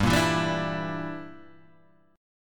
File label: E Minor 7th Flat 5th G